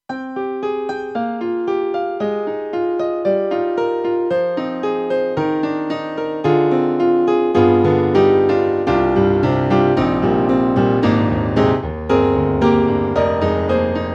Stereo Bright Piano; KORG M3 XPanded Program

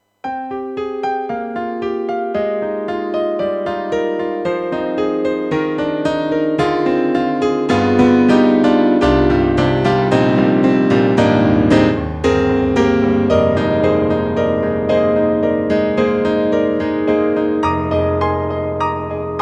YAMAHA MU500 Mello Gr P

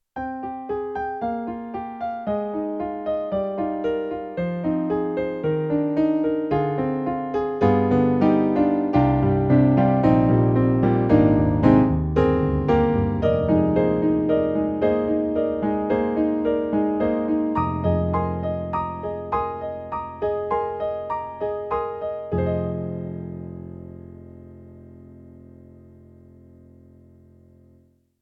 YAMAHA MOTIF-RACK ES Romantic Pf

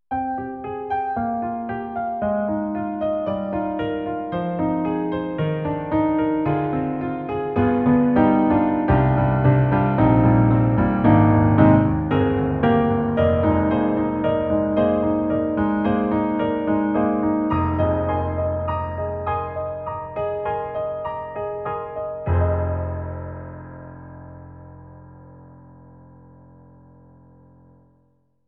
European piano, EDIROL SD-90; St.Piano 1; European Pf

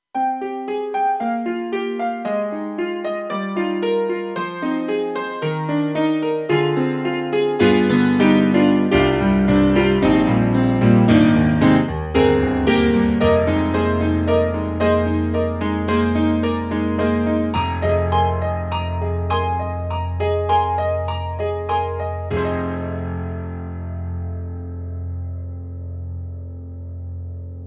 KORG Ultra Pop Piano, TRITON-Rack; EXB-PCM08

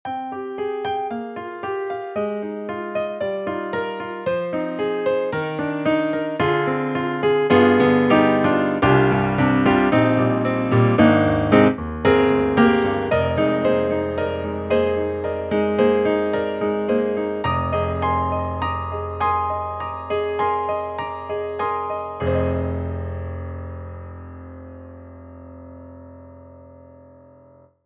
Grand Rhapsody Piano Full Reset; Waves

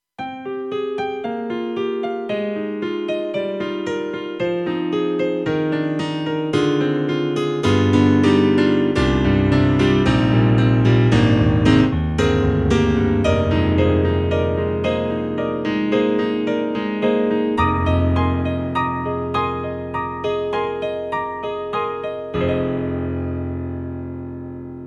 KORG M3 XPanded New Harmonic Grand 2; M3 Version 1 Wave

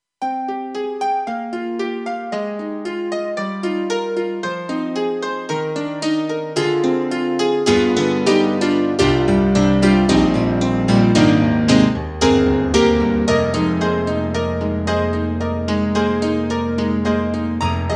euro pop piano, TRITON Rack; KORG EXB-PCM08

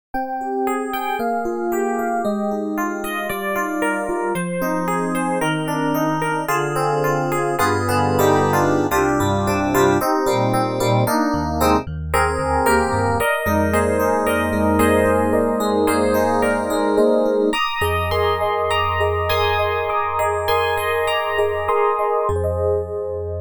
80s E-Piano; EastWest; Quantum Leap Goliath

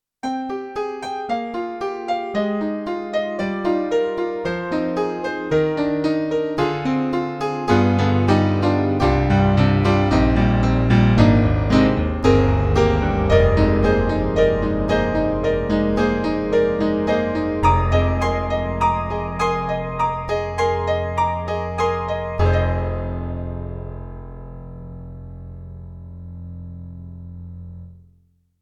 Alesis NanoPiano Acoustic Piano Ballad Piano

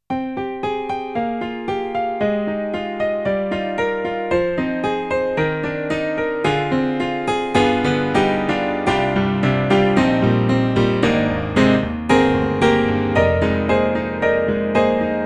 Rock Piano; KORG N1R

